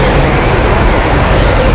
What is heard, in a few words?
drift; Nintendo